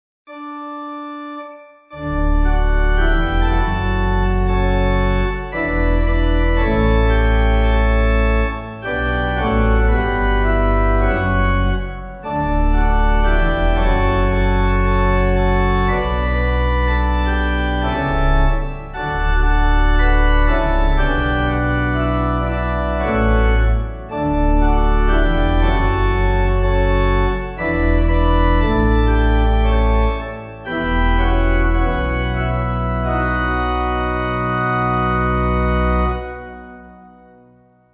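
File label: Alleluia Pipe, Pipe Organ; Alleluia 1982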